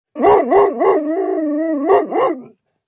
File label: Dog Barking Ringtone; iPhone